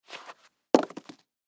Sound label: High Heel Shoes Drop; High Heel Shoes Take Off Drop On Carpet; Blastwave; Foley